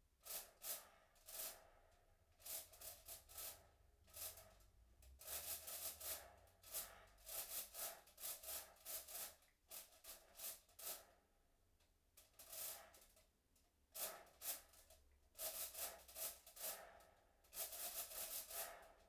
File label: Tin foil All closed breathing in and out